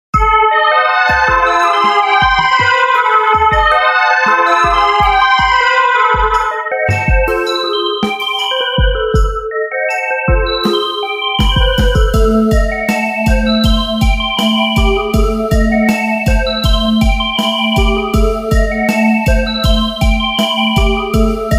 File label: Techno Rain Water